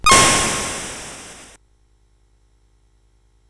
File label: BASIC sid CSG8580R5